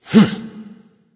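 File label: grunt lift, male; smite; voicepack; rama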